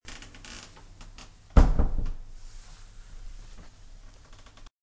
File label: door